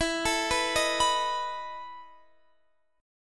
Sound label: E Major 7th Suspended 4th E down Guitar Standard; arpeggiated; capo 9